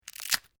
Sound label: DRY BRITTLE SNAPPING; Various Organic Foley sounds; Human